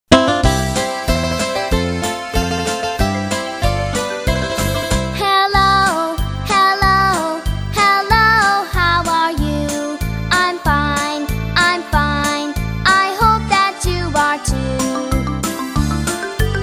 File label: Hello Song; バイリンガルクラス スピーチコンテスト練習音